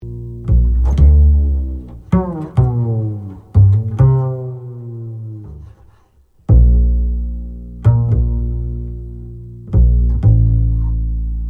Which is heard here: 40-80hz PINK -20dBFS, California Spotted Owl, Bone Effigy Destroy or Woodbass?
Woodbass